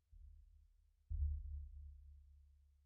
Sub

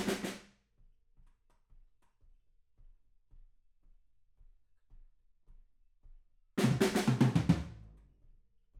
Room

